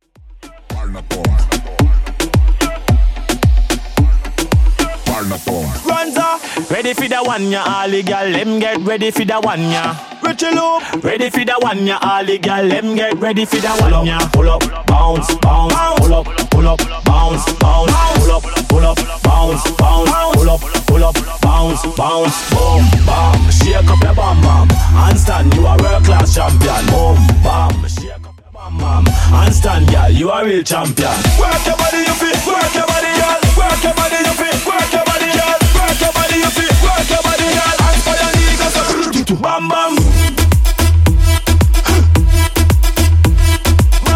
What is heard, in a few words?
Moombahton Extend Intro 110bpm